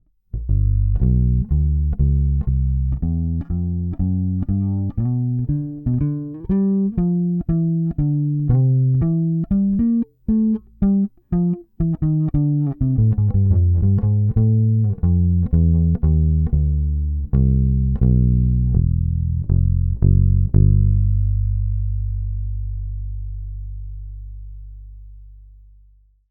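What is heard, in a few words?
Fender Precision Booster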